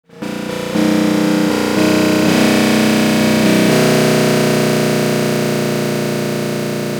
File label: snare